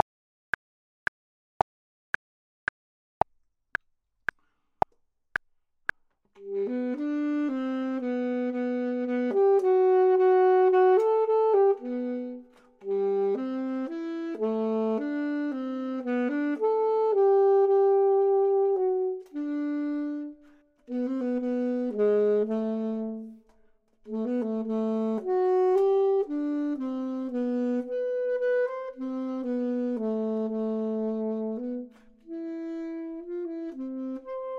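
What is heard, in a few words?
bottom, Saxophone and Clarinet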